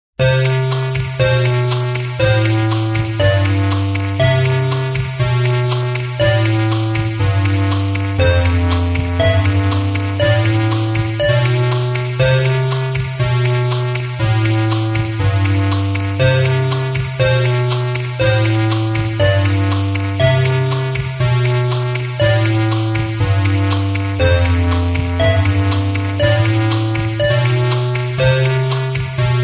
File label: Electronica 8-bit; Au clair de la Lune